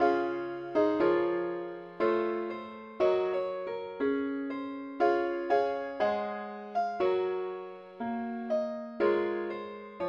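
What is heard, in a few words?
ukelele key c sharp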